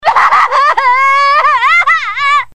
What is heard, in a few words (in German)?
heul